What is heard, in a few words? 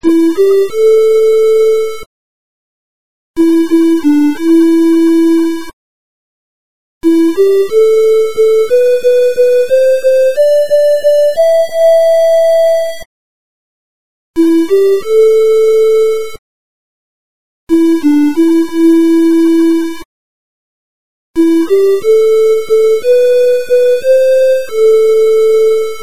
ringtone; olimpia athen2004; passtheflame